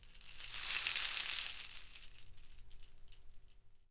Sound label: BELLTREE; ILIO; Synclavier Percussion 2; World & Orchestral